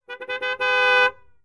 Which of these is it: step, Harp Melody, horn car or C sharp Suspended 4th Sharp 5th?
horn car